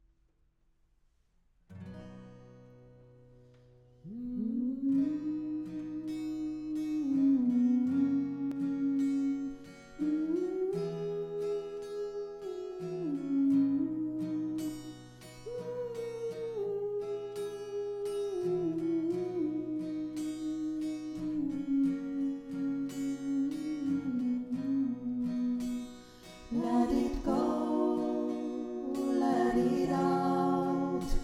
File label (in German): dreistimmig